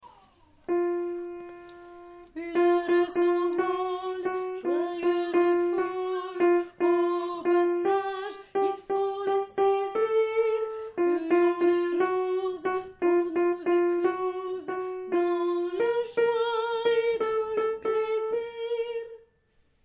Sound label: tenors